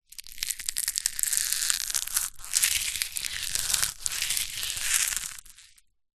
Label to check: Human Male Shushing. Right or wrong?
Wrong